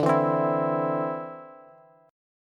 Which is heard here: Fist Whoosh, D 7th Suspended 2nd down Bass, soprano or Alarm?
D 7th Suspended 2nd down Bass